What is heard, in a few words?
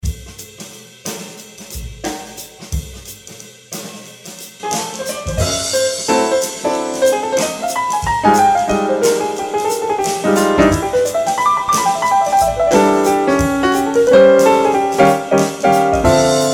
shuffle jazz